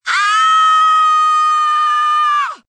Scream Raspy, Scream, female, long tone, raspy, fear, terror (human voice); Screaming Sound Effects